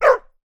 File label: animals dog bark springer spaniel; sound effects one